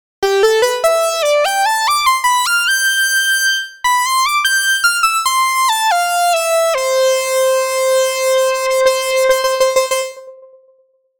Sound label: SATURATED ANALOG; Dawesome KULT